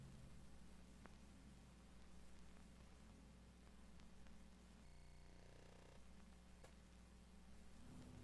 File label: line noise, Vinyl